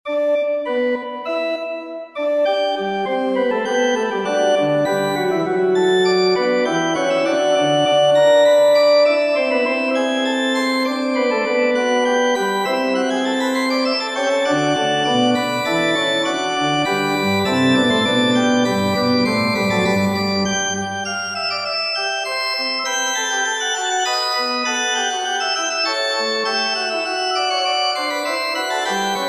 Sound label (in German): Fuge in G Dur; BWV581; Bach grosse Preludien und Fugen